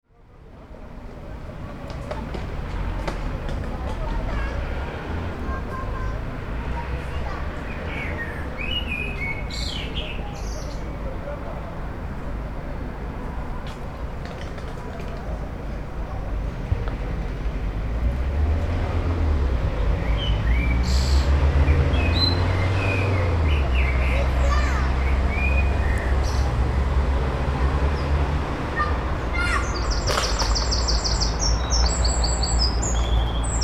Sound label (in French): amb urbaine